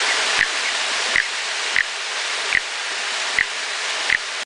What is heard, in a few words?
Phasmahyla cochranae